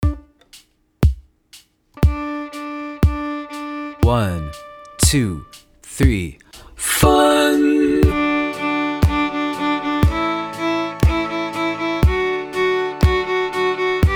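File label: reverse tucka, violin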